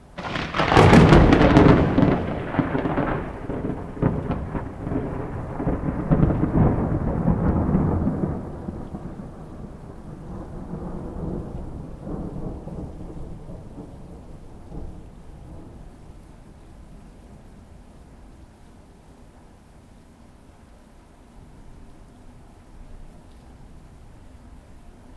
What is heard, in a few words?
rain and thunder heavy thunder; Sliced